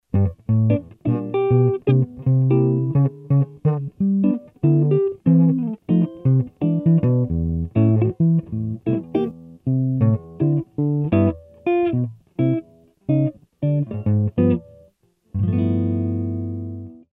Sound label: jingle jazz